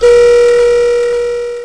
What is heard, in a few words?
BEAM